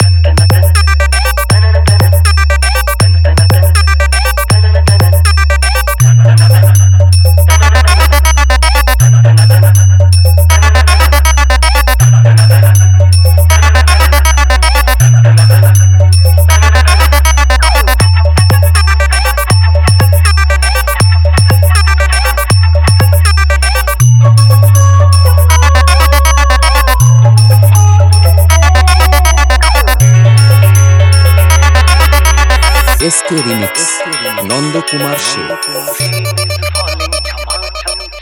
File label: Rain Is Falling Hindi Face To Face Music Open Challenge Competition Long Humming; Barman Music